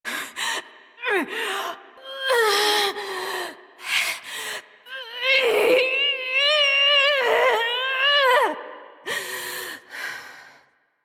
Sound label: breaths; Aoi; Warframe; NineteenNinetyNine; Stage8ShitboxConvoy